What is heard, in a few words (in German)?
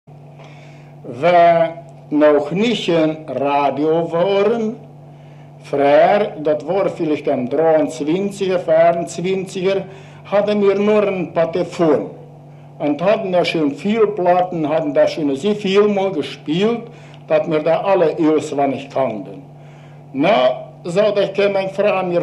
leichte musik; reussen